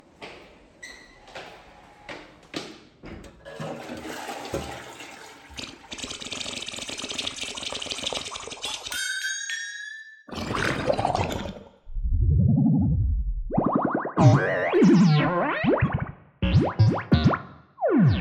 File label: jiggle